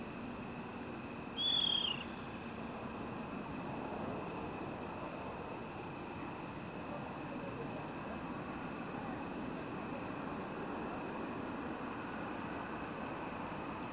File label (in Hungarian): guvat hangja